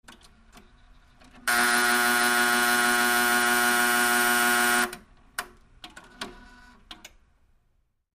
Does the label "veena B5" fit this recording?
No